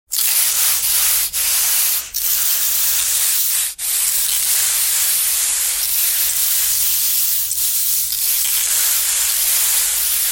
scraping thread noise

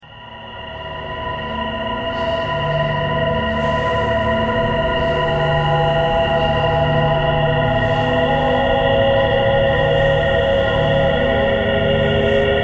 traffic core birds thread simple

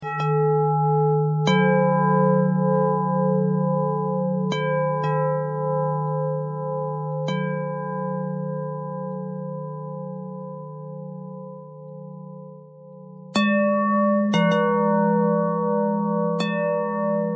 bell thread bend thread

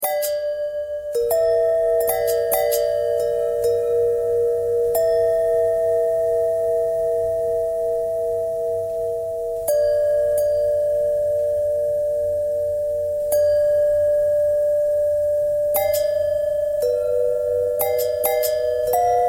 bell long bell threads; bells

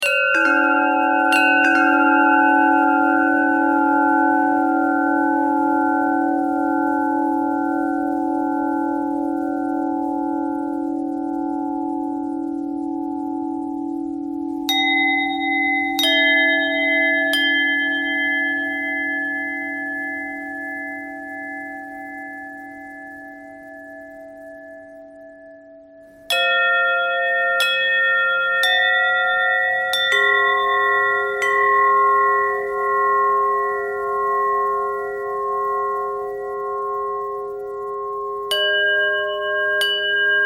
bell bell thread noise, bells

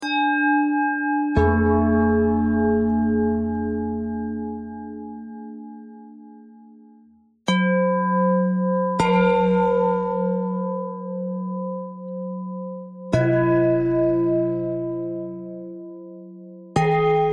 bell bell thread noise; bells

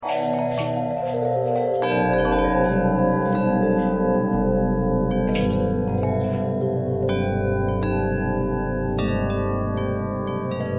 bell long bell twist, bells

